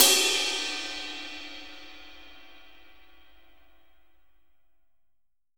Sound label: Room Ride, Room Drums; Akai MPC500